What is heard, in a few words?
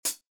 Hi Hat; Closet Hat